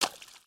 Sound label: Foot Swamp Water Run; footsteps